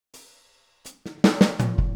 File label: Boogaloo Intro Full Mix, Drums, 82 BPM